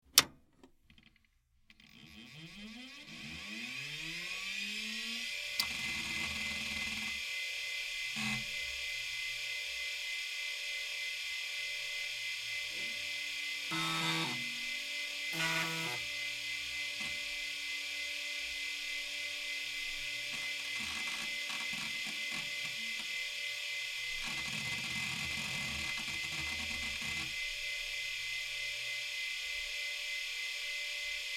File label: Pc Startup Hard Drive, hard drive whir